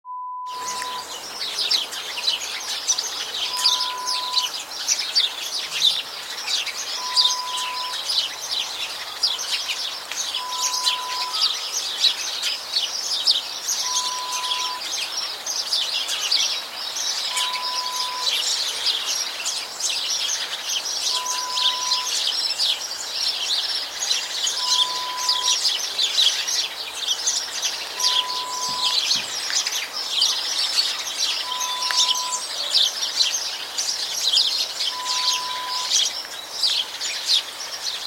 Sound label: bird sounds